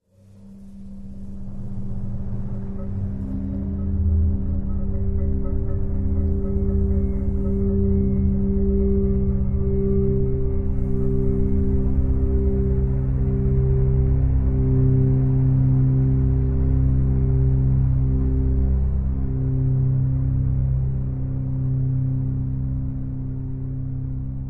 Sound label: Tense